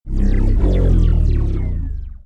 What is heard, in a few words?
Cruiser Run; Sid Meier's Civilization 4 Complete; Beyond the Sword; Final Frontier; Units